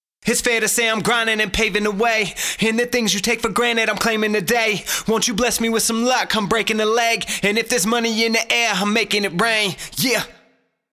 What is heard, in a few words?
cadence rhythm end rhyme breath